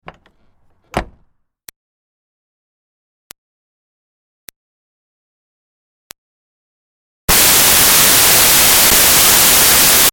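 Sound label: Car Smart Car Door Open-Close Light; 2005 Smartcar Fortwo; Vehicles; Big Room Sound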